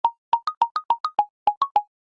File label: Whisper; Coolpad 1827; alarms